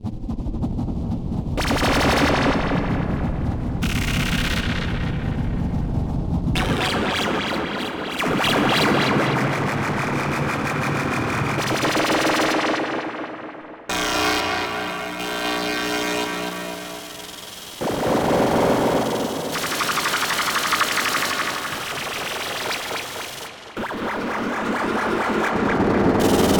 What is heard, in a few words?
Squelch